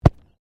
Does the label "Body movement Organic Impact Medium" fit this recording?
Yes